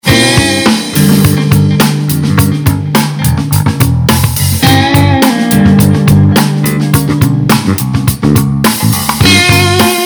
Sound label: Radio Blues